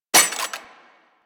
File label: Burst Rifle Mech Secondary; Weapons; Grineer